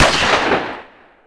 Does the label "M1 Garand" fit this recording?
Yes